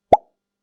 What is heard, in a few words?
pop